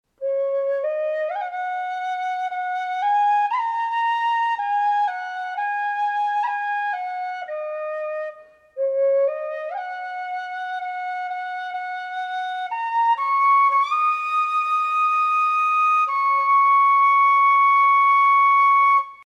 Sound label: harp high d